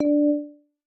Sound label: Positive Feedback D